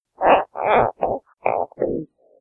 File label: Stomach Noises